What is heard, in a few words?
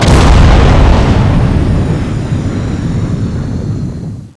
explode; custom weapons; redeemer